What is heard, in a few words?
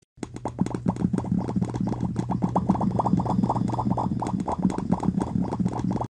popcat